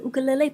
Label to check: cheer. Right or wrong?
Wrong